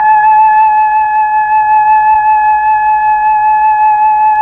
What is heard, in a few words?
FLT, Melotron Flt, Tron Flutes